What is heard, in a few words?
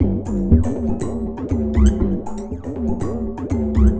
Rhythmic (120BPM); musicradar